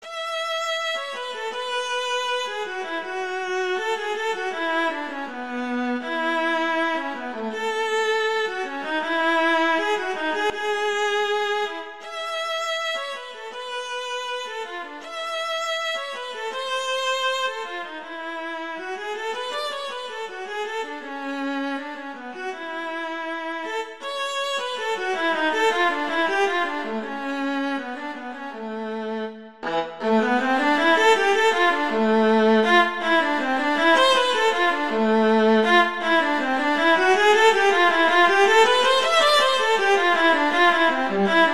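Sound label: Arabesque, viola; Palaschko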